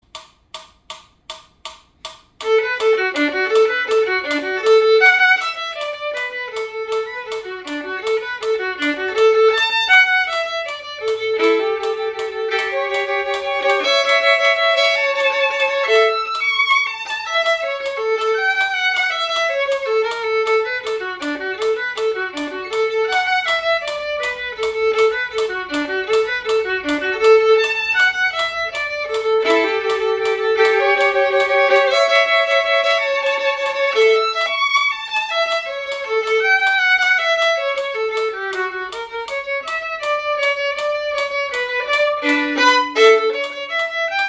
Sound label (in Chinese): 小提琴; 示範演奏; 賽滋 第四號學生協奏曲 第三樂章; 新版 篠崎小提琴教本 第三冊